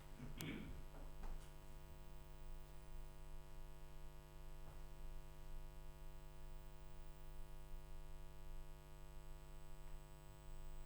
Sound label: Room, Choir